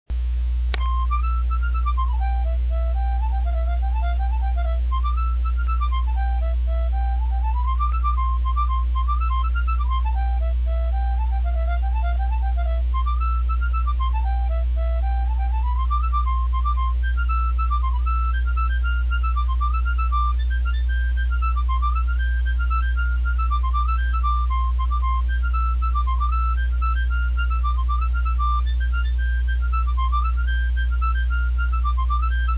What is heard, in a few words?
St Annes Reel harmonica; CLC harmonica